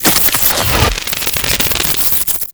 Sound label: Grapple Electricity Burst; Enemies; Warframe; Grineer Nightwatch